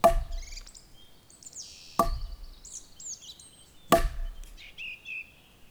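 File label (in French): Sonnorité sympa, Percu Bois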